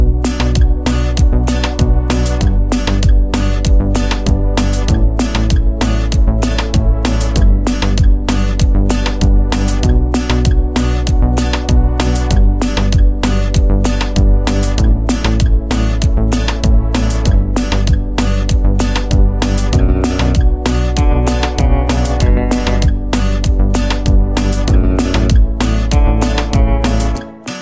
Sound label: Ringtone Instrumental